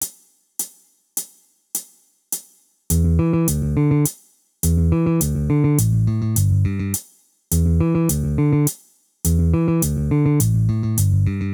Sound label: bass